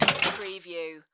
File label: Pinball sound, launch with clanky rattling